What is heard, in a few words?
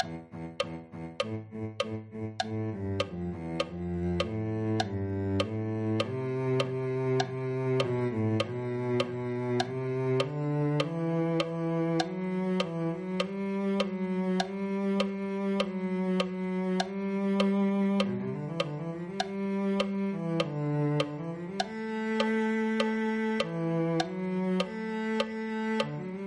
cello